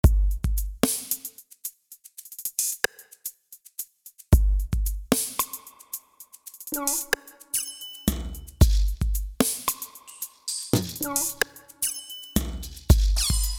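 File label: Eclectic Drums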